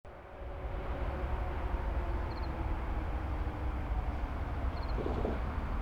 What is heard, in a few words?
cricket; crickets